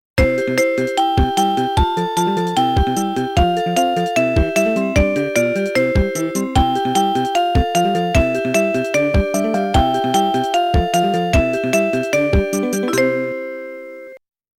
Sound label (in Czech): melodie